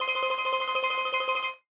money tally; Skillzgaming; fruit Blast Desktop

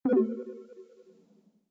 freespin summary counter stop; Rabcat; Classic 243

